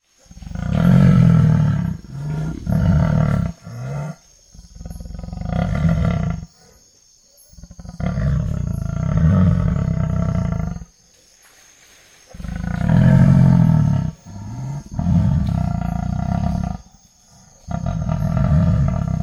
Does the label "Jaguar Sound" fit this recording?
Yes